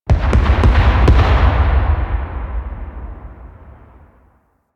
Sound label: Distant Explosion; Warframe; The New War; Teshin Intro